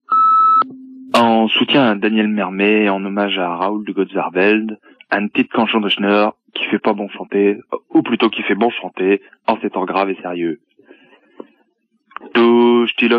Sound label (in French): Chanson du Ch'nord repondeur